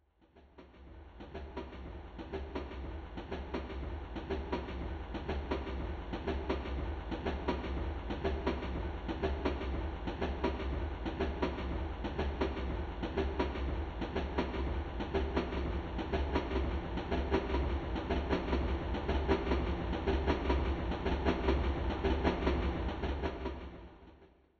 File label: musicbox